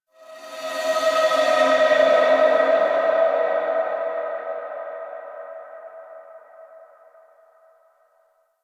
ambient texture; Ambience; Warframe; The War Within; Golden Maw

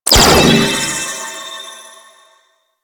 Proj Attach, Weapons; Warframe; Cephalon; Ceph Primary

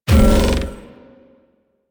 Rail Jack Weapon Loop Stop; Weapons; Modular Weapons; Weapon Four; Warframe

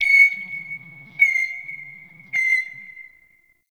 Forest Bird Mid; Ambience

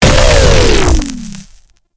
Proto Infested Mutalist Speaker Destroy; Warframe

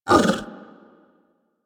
Interact Bark Speak, Kubrow; Warframe; Tame

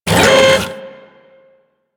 Thumper Bot Servo A; Warframe; Grineer

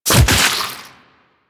Gore Spew; Enemies; Warframe; Infested; Grey Strain; Splitter

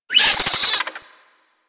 Infested Minion Pain; Warframe

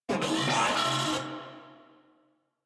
Vocal Enemy Spotted, Enemies; Warframe; Moa

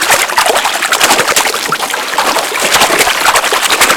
Slow Bomb Proj Loop B; Warframe; Infested; Moa; Attacks

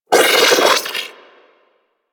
Sentient Battalyst Vocal Pain; Warframe; Sentients

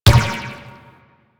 Ball Bounce Rock; Warframe; Missions; Tenno Ball

